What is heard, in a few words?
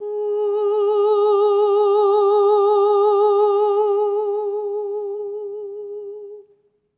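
Soprano Oh G#4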